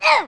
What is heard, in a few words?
mantis die